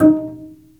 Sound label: BASS PIZZ D#4; SOLO PIZZ ST; Greytsounds VOL-1